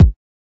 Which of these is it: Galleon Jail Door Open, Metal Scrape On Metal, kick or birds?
kick